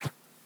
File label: Whistle, Organic Wooshes; Micro Pack